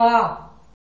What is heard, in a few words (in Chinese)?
哇 bass